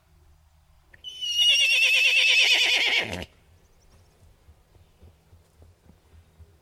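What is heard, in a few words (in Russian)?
звук ржания пони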